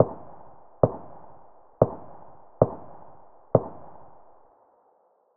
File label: ak74 tap slow; weapons; SPT Battle Ambience